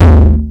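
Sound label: tom distorted low, Yamaha Motif ES-Series; 300 Drum Machines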